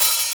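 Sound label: open hat; Funky Ass Loops; East West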